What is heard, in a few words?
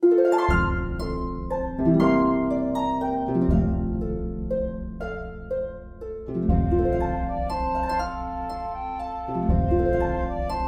flute track with Melody; Silent Night